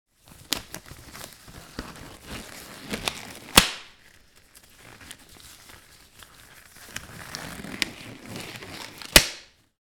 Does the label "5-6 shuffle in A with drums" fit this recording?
No